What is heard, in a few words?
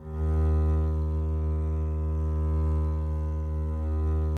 eb2 down, arco looped; meatbass